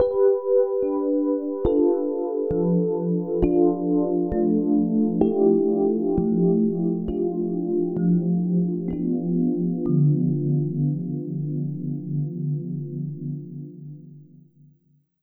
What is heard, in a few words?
FM Chords, Keys; Sonic Foundry (Sony Creative Software); Slow Silhouette